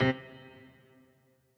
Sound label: piano riff intro